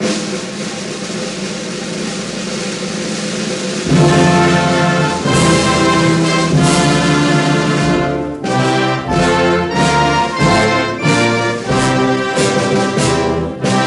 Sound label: National anthem O Canada (Instrumental)